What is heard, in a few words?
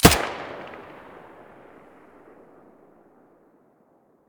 mp5 muzzle stereo, weapons